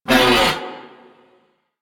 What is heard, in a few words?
Ram Pain; Animals; DE; Warframe; Quests; Duviri